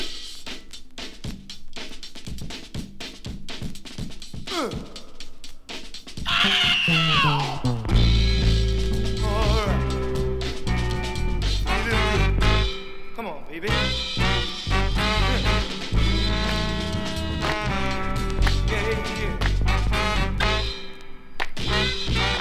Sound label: Panama Funk Sweet Soul; DISCOS ISTMENOS